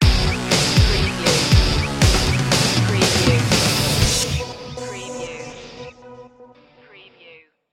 armageddon stinger

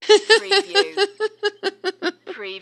Female Giggle

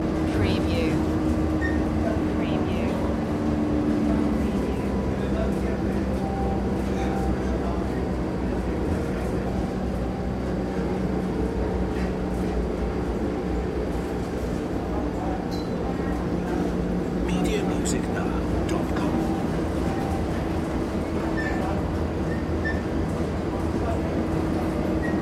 kuala lumpur monorail ride; loop

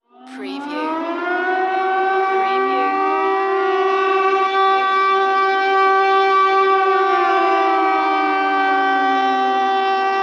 Air Raid Sirens Paris Vinta

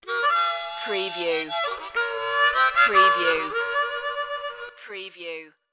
sad harmonica sting

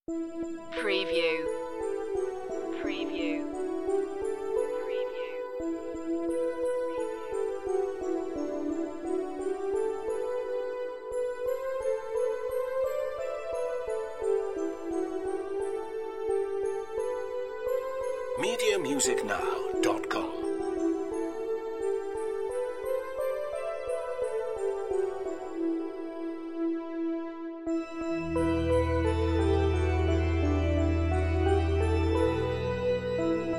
synth organ; god rest ye merry gentlemen

